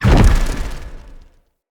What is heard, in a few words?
flock shot; Enemy; Abilities; Thawtide Hunter; Sinecure; Ranged Hunter Thawtide